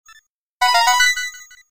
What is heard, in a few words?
red coin; Super Mario 64; Mario Coin Sound Clips